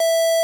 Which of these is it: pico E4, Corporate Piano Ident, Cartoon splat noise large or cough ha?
pico E4